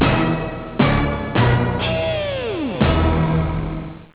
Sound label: action